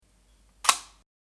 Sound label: Leica IIIc Sound